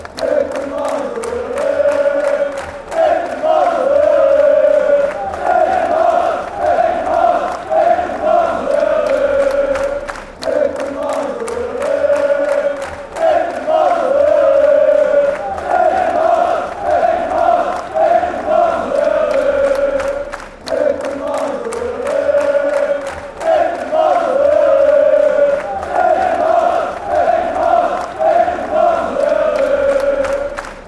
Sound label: ole olee, chants; lokeren